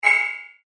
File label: alarm